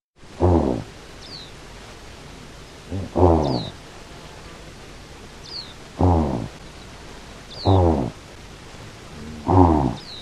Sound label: Saola; شاخ دوکی